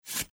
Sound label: decision slides